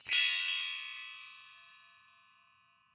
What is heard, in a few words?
Elephant Bells; World Percussion; Cymatics; Emperor World Percussion